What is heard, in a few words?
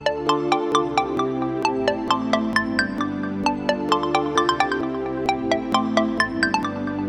nokia original ringtone